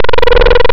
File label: Bright And Rude, wavetables; Animoog